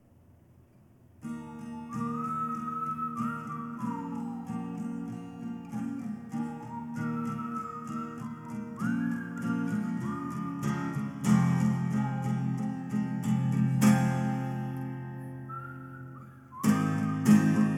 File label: Whistlin